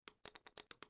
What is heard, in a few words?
casing default; bullet impacts; Soldier Of Fortune II Double Helix; XBOX